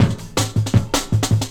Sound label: Breaks 160, Drum&Bass; EZ Rollers